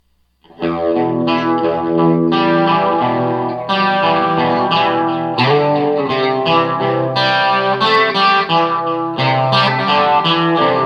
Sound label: Em Dsus C, riffs; making riffs